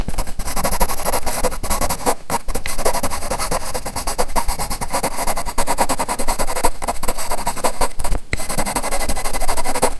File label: loop natural and slow dra, pencil